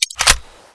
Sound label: thompson clip in